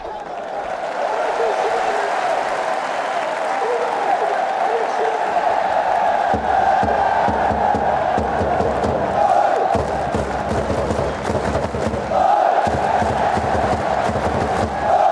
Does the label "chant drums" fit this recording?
Yes